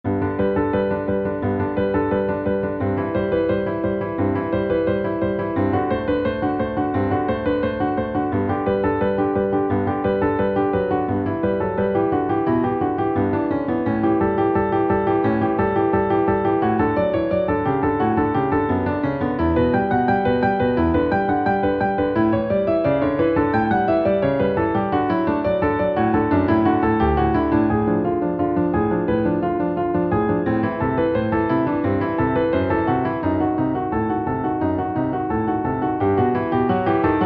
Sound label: Prelude Cello Suite Nº1; Bach J.S.